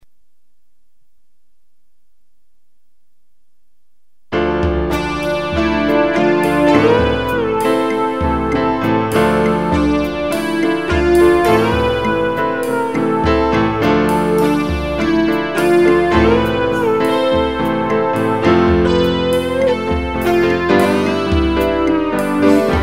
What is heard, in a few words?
Piano Guitar Drums